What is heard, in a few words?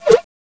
BLEEP, SPACE